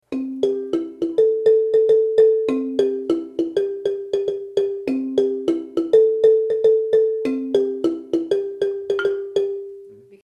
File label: high line, marimba